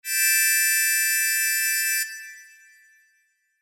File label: Sho